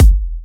Trance Kicks

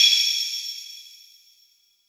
CYMBORINE; Drums In Your FACE!

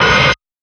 Hats, Alesis Performance Pad

